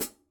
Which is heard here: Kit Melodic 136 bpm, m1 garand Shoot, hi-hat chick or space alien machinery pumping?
hi-hat chick